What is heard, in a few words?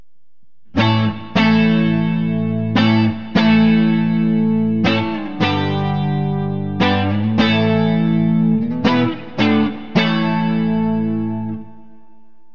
neck clean; demon; burny